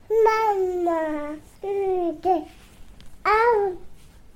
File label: Baby Talk